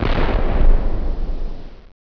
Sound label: cannon